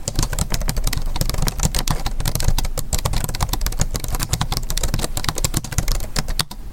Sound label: typing sounds; loudnorm